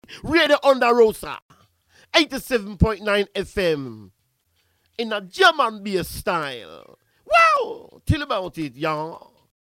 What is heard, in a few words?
Jingle Drum & Bass Style